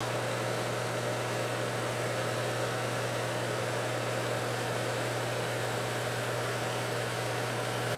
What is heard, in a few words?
continuous bathroom exhaust fan humming; machines